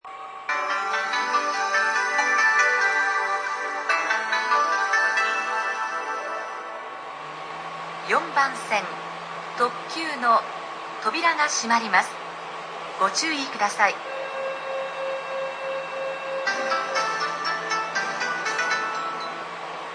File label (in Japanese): yodoyabashi hassya, ekihousou; 京阪電鉄-淀屋橋駅; 4番線（特急発着）; 4番線発車放送